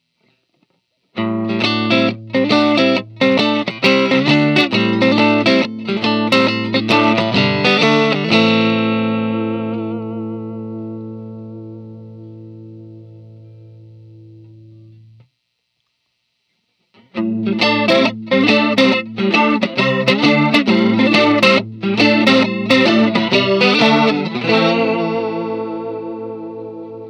First up is a little clean blues groove. The chorus, when engaged is set to 3pm on both Rate and Depth to get a sort of vibey effect, guitar; CE-2